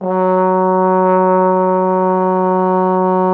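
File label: brass trombone F#0; Miroslav Vitous; Solo Instruments VOL-1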